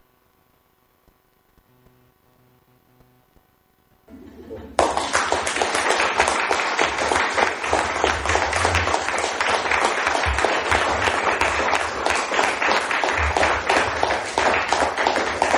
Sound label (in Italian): applausi